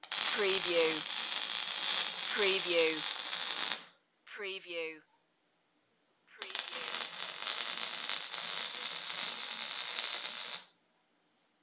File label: Weld Torch Mult